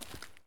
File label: walk concrete; Escape from Tarkov